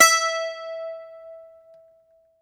Greek Bazouki, Plucked Strings

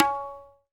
TABLA NAH; AKAI S6000 CD-ROM - Volume 5

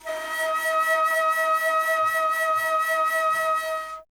Alto Flute, Solo Instruments

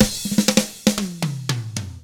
116 ROCK, Double Platinum Drums; ILIO

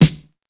Snare, Fairlight CMI III

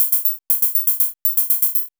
MOOG HAT; AMG - Vince Clarke Lucky Bastard

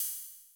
cymbal (high), Yamaha MR-10

